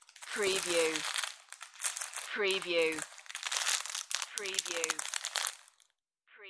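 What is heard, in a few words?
Plastic Wrapper Crinkle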